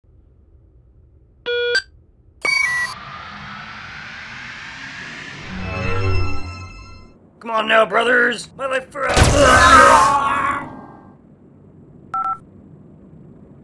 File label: Teleporter; Fleeing The Complex